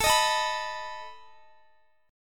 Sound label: A Suspended 2nd Flat 5th A down Guitar; Asus2b5; strummed